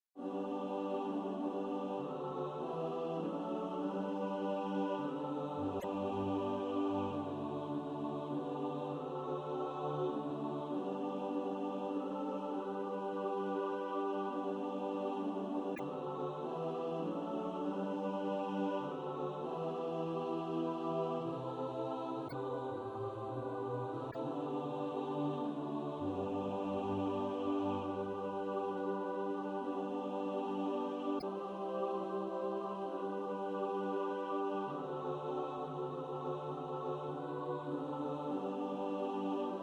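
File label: alto, Practice Music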